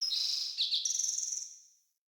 Bird Song; Pothia Bird

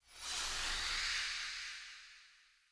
Short Sword Melee Wind Up; Weapons; Player

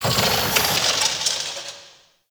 Distant Machines; Ambience; Warframe; Corpus Railjack

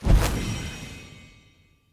Weapon Upgrade Word Select

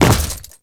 Step Default, Footsteps; Warframe; Grineer

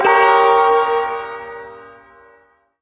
Ersatz Shawzin Phrygian Chord H; Warframe; Duviri Ersatz Shawzin; Chords

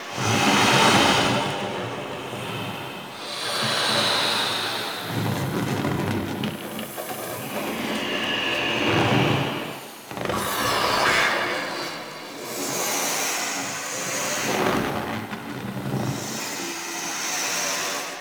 Rubber Air Tunnel Loop; Warframe; Ambience; NineteenNinetyNine

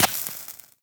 Laser Impact Generic; Warframe

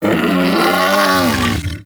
Vocal Death; Hunting Critters; Predasite

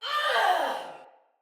Death, Soldier Female; Battle; Barks; Dialog; Sinecure Soldier Female A

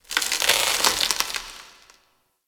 Corpus Infested Glass Crack; Warframe; Ambience

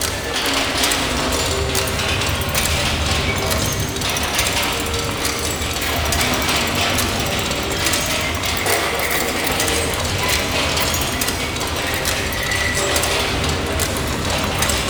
Giant Spinning Metal Wheel Loop; Ambience; Props; Warframe; Entrati

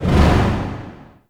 Mark Disable; Ash Storm; Warframe; Ninja

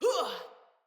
Attack Female; Battle; Envoy

